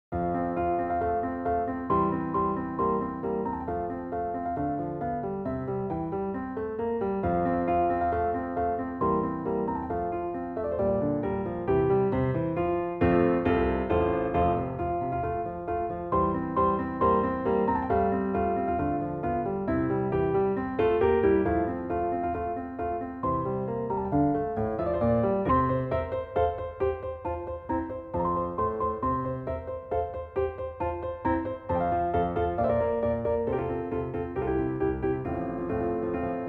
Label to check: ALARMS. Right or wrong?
Wrong